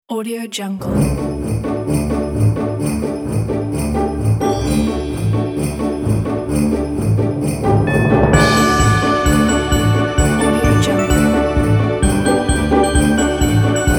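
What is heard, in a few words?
jingle bells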